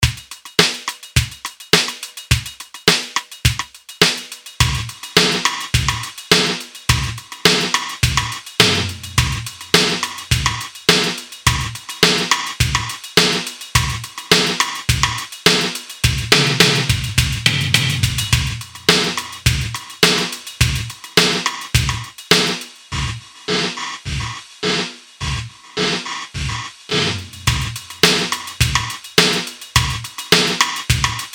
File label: Yamaha SPX90 Gate Reverb